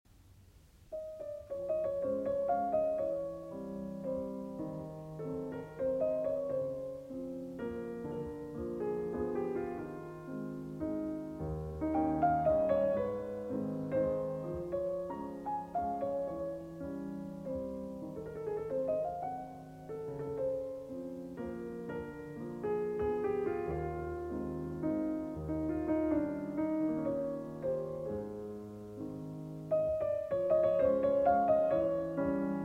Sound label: Waltzes, Moderato in B Minor